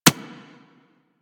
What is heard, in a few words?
Bullet Impact Generic; Surface